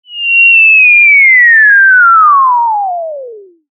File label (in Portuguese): queda desenho efeito sonoro